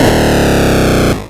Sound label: Arcade Riffs